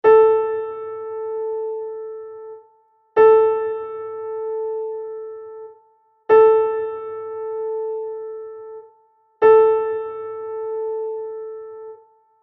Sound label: Concert A